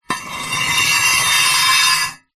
industrial metal heavy gate post pounder scrape concrete ground